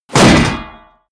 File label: axe hit metal